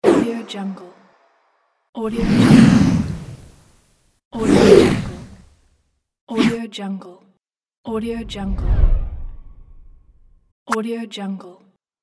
swish; 3dhome

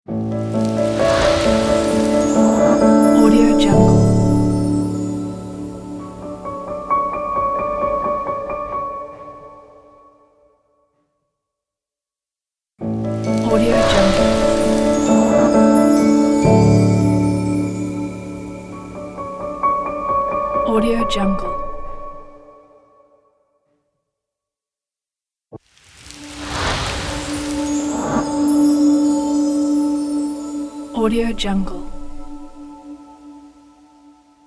light piano ident

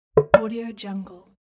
usb notification

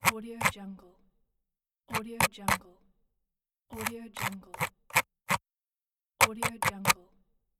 computer mouse scroll

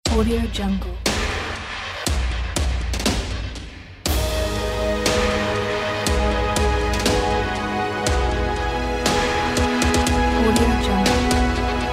great dramatic epic inspiration; ninjamusicstudio

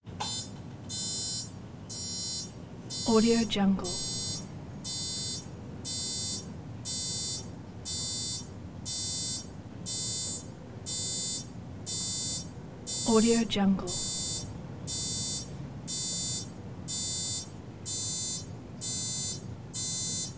alarm clock; prosoundfx

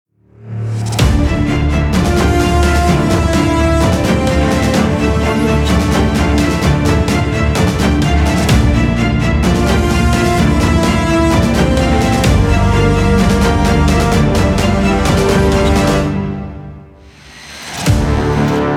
blockbuster trailer logo

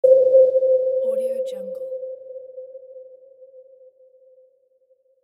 sonar; thenovard

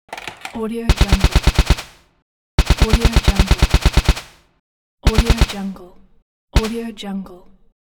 machine gun

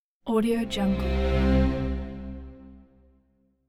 bass strings swells; hollywoodedge

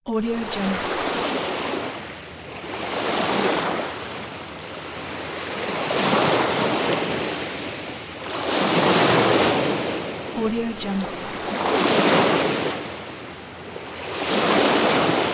waves

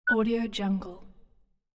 hud beep

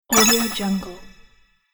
collect swoosh bonus

